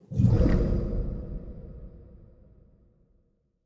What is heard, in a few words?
death sink; smite; hera